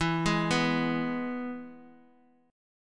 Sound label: E Major E down